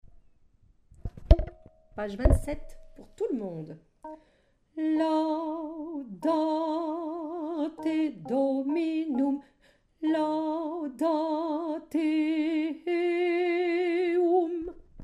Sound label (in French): psaume Basse